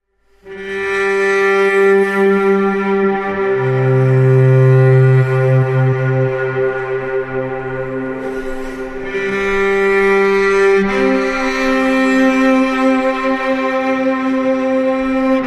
Cello

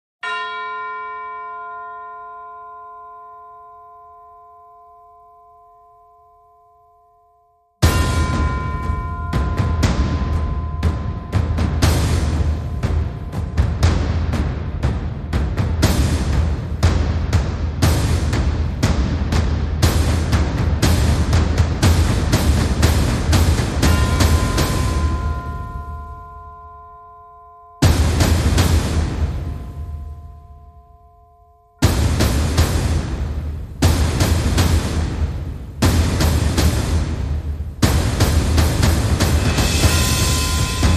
Drums

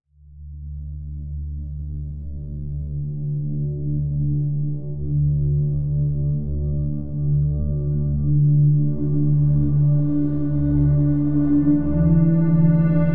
Worsening Situation, No Drums

